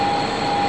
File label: Motor, Train; OpenBVE